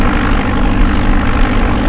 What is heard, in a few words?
plane